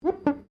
GLASS SQUEAK FINGER

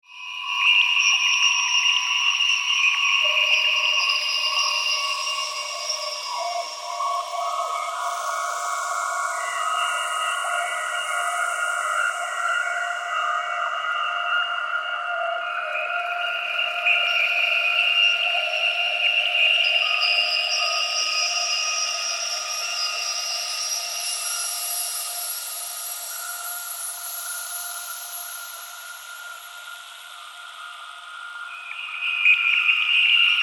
Mystical Ambience, Science Fiction; Mystical, Ambience, Science Fiction, 5.1

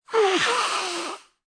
Human, Blowing Nose, Male; Sick

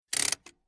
Old Tractor Handbreak Pulled On Mechanical Single; Transport; Tom Hutchings

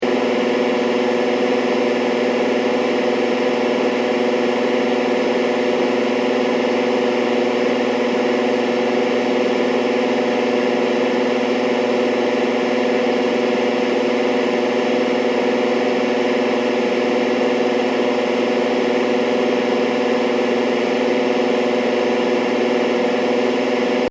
Radio Static Hum Buzz; SciFi Warfare Household; Sound Design

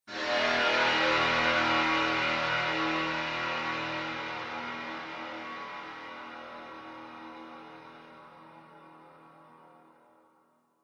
Horror Tension Transition; Twistedtracks

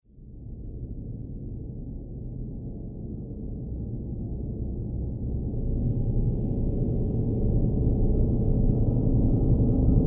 Horror Dark Piano, Piano Sound Effects; musical

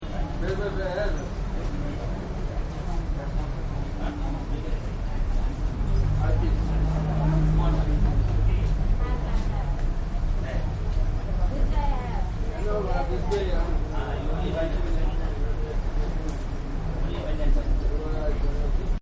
Traffic Store Voices India; Traffic And Cars; Partners In Rhyme

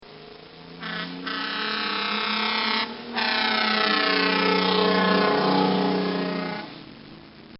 Firetruck Drive-By With Horn Blast

